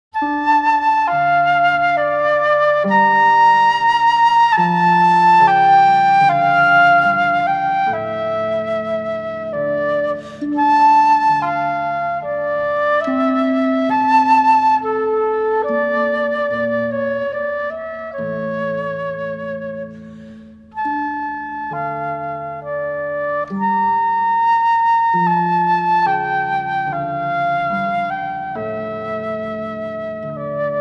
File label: Sarabanda; A.Corelli